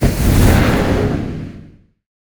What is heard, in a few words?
fireball cast; weapons; dota; dragon knight